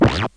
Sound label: Backpack shark recharge; gonner linux macos ps4 switch windows xbox one gamerip 2016